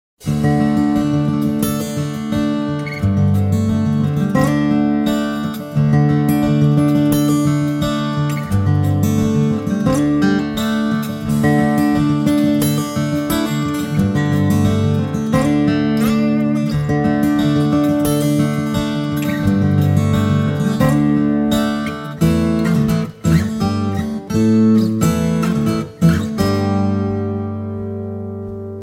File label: blues; groenvinter